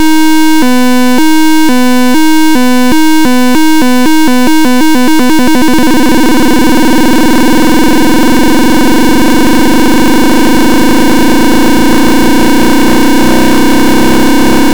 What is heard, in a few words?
pulse interleaving method